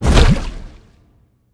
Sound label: Cast Ice; smite; merlin